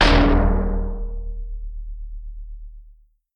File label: hit barrel; Nickelodeon - Destruction Truck Derby